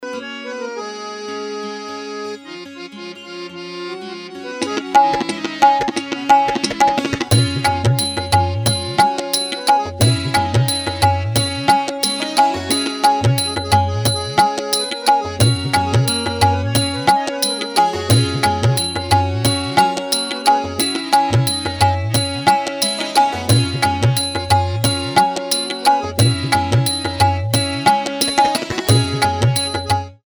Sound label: Bhajan Karaoke Song